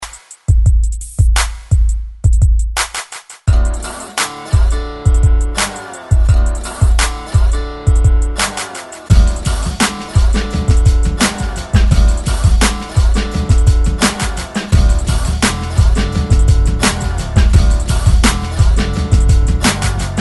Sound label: 90s Rock ReDrum 85Bpm; DJDOLLS